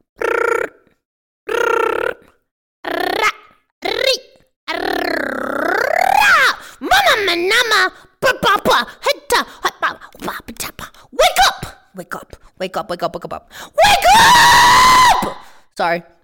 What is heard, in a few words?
alarm clock